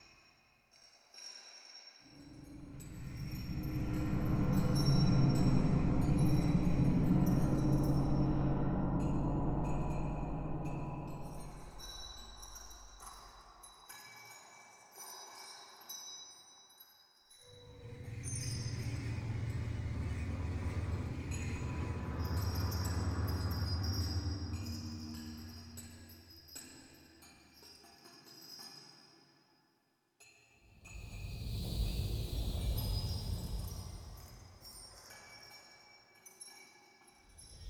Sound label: atmos bells